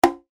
conga

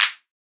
Claps

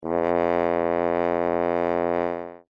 French Horn ff F2

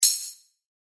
tambourine

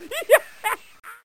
laugh

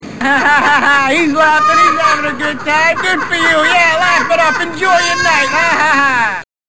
laugh

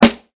snare

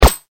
gun

